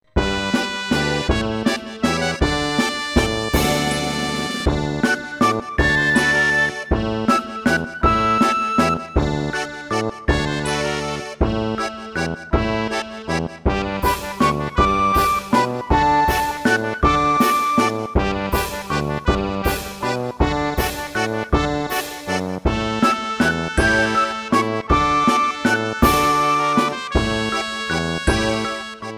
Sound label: Flower Waltz; Toad Bandstand